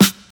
crisp snare one shot g key, acoustic snare sound tuned to the G note